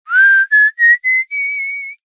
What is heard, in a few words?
human male whistle short tune riff